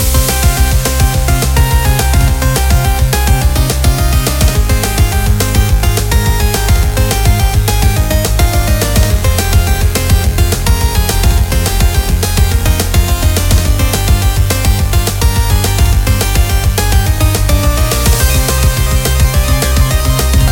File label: level